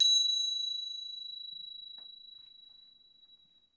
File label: Percussion & Harp; Miroslav Vitous